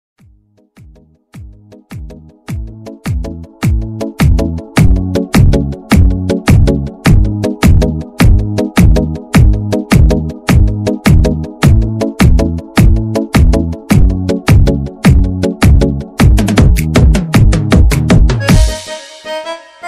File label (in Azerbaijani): azəri bass mahnısı; maşında qulaq as